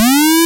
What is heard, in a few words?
Synth Shot